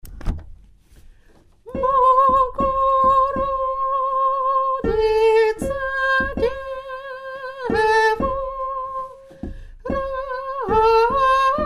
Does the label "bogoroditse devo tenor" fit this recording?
Yes